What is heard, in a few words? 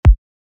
Kick Melodic A